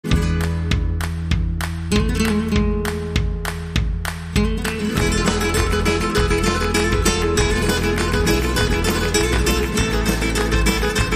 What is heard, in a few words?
Indie Flamenco